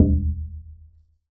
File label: Plywood Sheet Soft Strike